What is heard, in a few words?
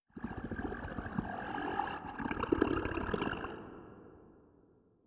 Creature; We Are the Dwarves